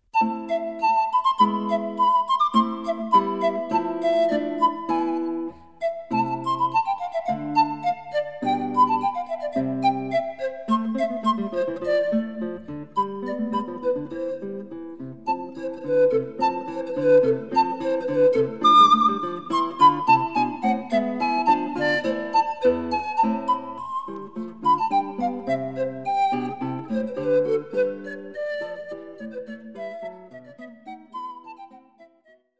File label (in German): Gitarre